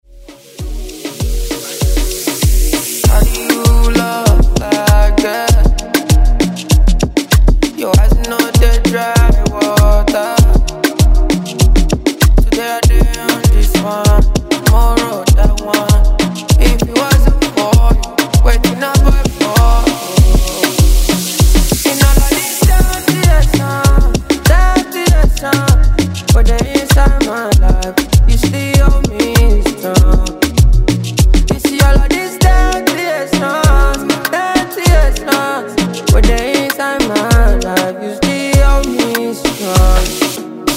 Afro Moombah; Redrum

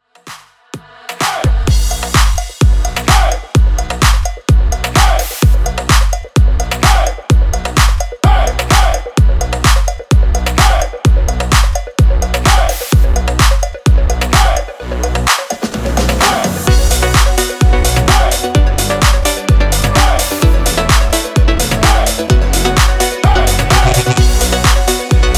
Hype Bigroom 128Bpm

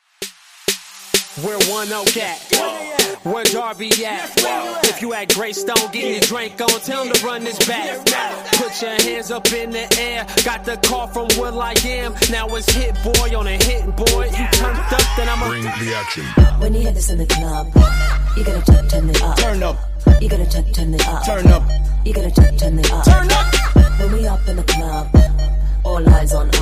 scream

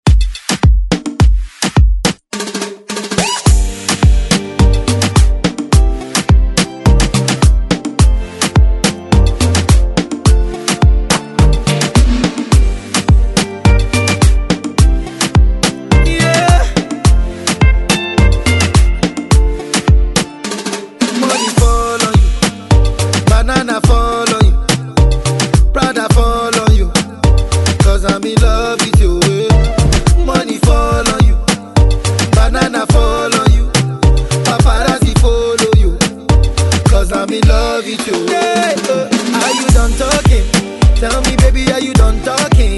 Extended 106 Bpm; DJDOLLS

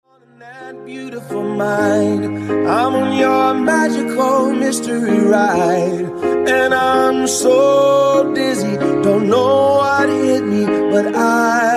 Funk Bootleg Segue 128 Bpm

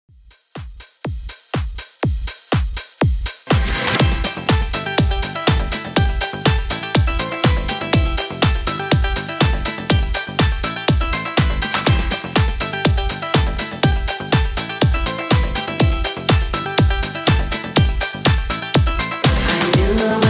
90s Classic 122Bpm

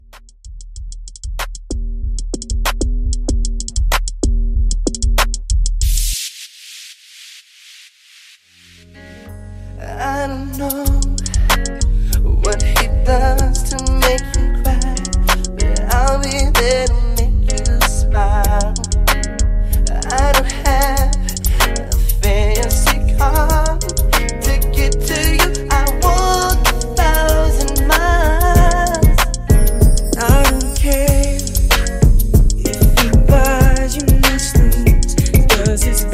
90s RB Funky Bass 95 BPM

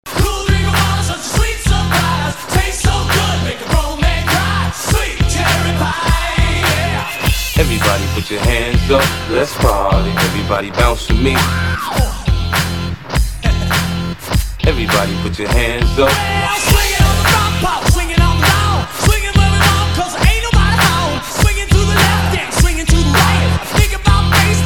Party Classic Hip Hop Rock Mash Up

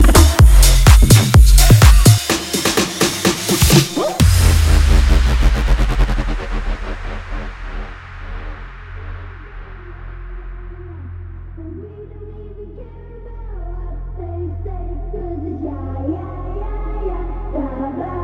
Big Room 131 Bpm

